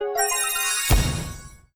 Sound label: result coin